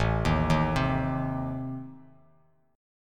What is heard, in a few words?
A Diminished Bass Standard; Adim arpeggiated; 5,6,1,5; JGuitar